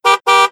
Car Honk

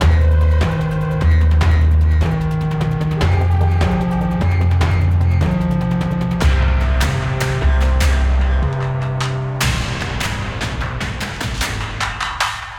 Boss Combat; Griftlands

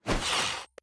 puzzle lamp close

